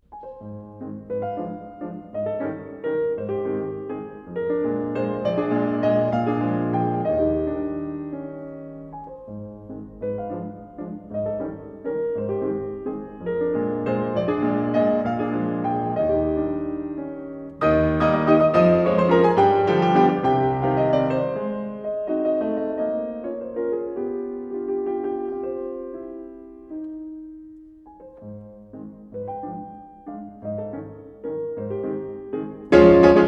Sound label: Carnaval Valse allemande; Paginini; schumann recital